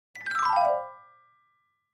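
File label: Clavichord Descending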